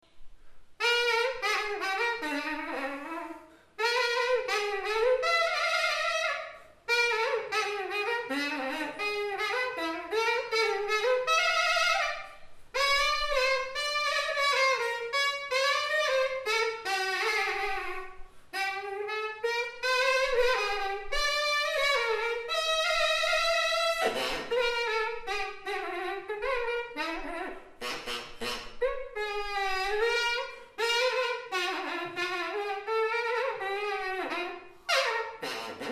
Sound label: THE JUMBO SAX TOOTER; Jumbo Sax Tootophone